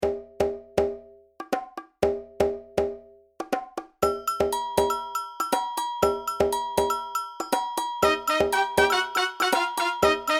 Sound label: samba danzon